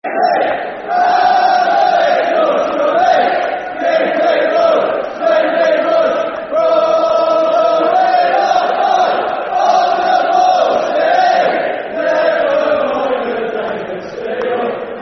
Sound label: On The Ball City, Football Chant (Soccer Song); Norwich City; Norwich City Ncfc